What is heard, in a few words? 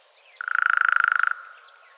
hairy woodpecker drumming